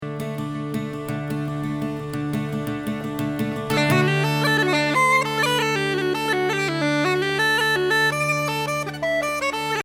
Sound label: open slip jigs @113